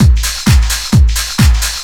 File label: drum 130 groove; Techno Trance Essentials